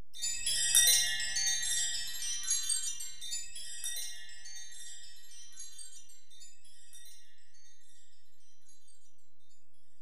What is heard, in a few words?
Ac Perc, Drum Machines Alesis DM-PRO